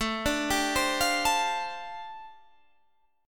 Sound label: G 7th Suspended 2nd Suspended 4th A